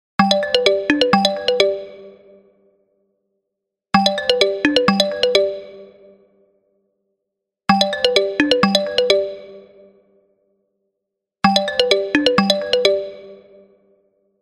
Bounce, ringtones; Alcatel OneTouch V985N

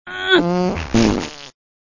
Human Grunt Fart; Motorola E398; Hijacked by MTV

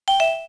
voice rec start; LG SU780 Lollipop 3

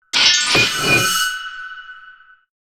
Engine Slide, phonetones; Sony Ericsson; F305; PlusFourSix; Ying; Lars Edvall

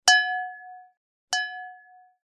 Glass, Ringtones; Samsung; SGH-F310 Serenata

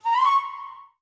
Space Flute; Huawei Vitria

